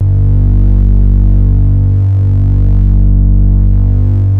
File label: MOOG BASS G2; Best Service Dream Experience